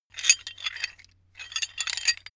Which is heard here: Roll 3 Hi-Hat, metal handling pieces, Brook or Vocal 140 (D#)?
metal handling pieces